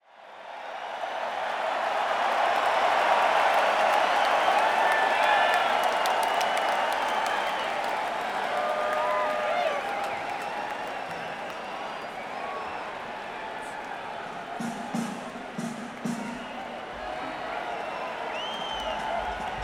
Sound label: Crowd, Madison Square Garden; New York, NY